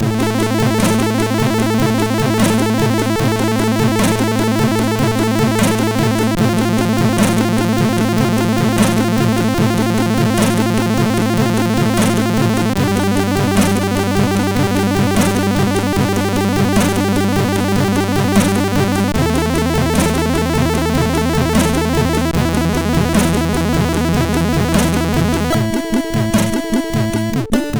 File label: Lame Over tune, MOS6581R4